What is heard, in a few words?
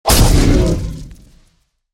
Attack; Sol Forge